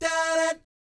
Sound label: DAH DAT, Vocal Jazz FX; Spectrasonics; Vocal Planet; JAZZCOMBO